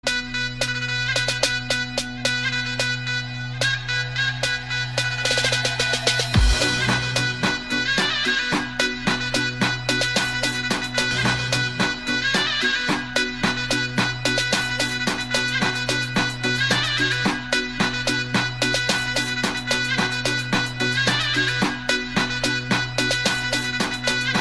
trance Arabic ringtone

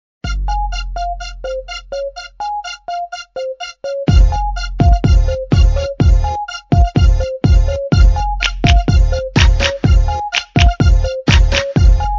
samsung j7 max ringtones

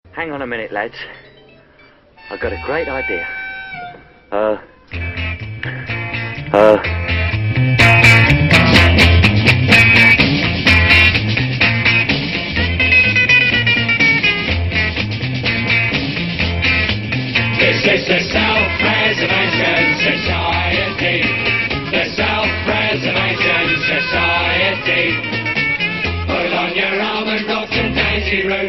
italian tune ringtone

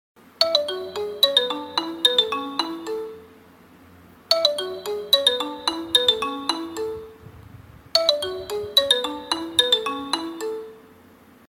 nokia 2 tone ringtone

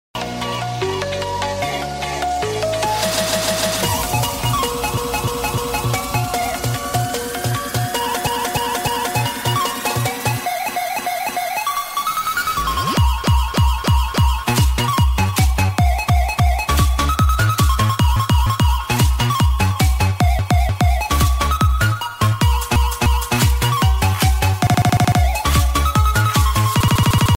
bass boosted remix ringtone

